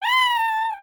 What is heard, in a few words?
Buzz Death